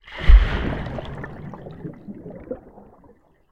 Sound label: nature underwater splash bubbles